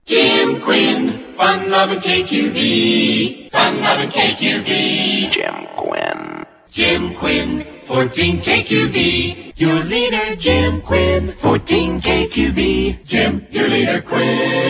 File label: jing